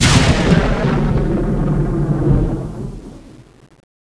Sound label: core zap far; dm criticality